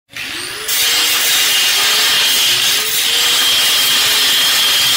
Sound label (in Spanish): Sonido Esmeril De Banco